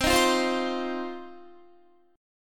C Minor Double Flat 5th C down Guitar 4F 4A 5C 5G 5C 6E; Cmbb5 strummed; JGuitar